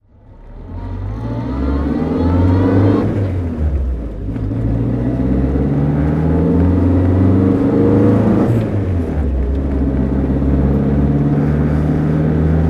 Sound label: renault 4cv 750 driving; Renault 4 CV, 1958; Engine sound driving; V3D/ ClassicarImages; Marc Vorgers